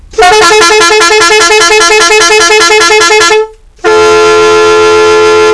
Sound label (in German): car metall